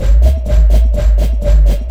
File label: FX BEAT